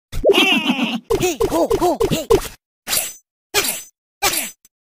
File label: Goblin